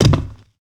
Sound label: footsteps land glass; player